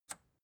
record player power push off

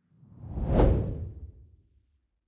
whoosh simple hard long

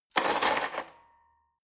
cutlery forks drawer place

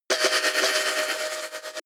drill synthetic

